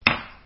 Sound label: hammer; Picture Keyboard